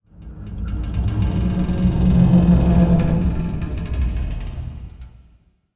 creak

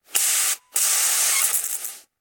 spray

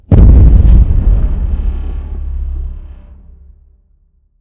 explosion creak

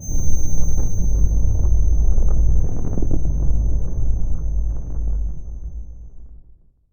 flash ring

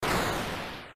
minesweeper explosion, arcade

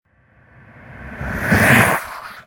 growl, hallucinations

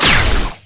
Ion Rifle

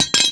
sword drop; VOREStation

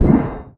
clang small

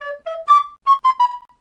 Warp Whistle

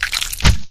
blob attack; Bubberstation

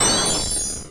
barrage spell hit, weapons

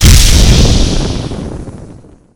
Fireball, magic

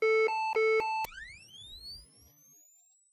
defib charge

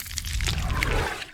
enter blood, magic

